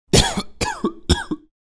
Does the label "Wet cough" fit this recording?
Yes